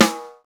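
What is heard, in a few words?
Snare, Roland; Real Snrs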